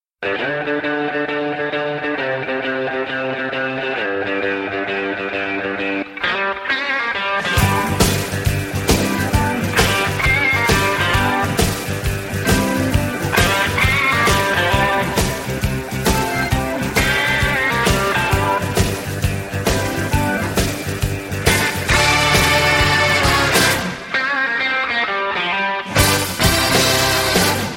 funky

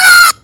kaaaaaaaaaaaaaa

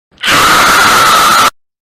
extremely loud scream

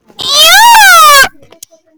yaaaaaaaaaaaaaaaaaaaaaaaaaaaa

